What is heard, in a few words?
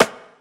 clap one shot, Pop drums; rumor; Slate; SD PASADENA Pop Sample Pack